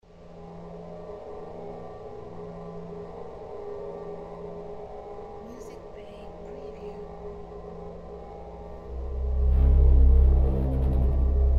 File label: Ambisonic Nightmare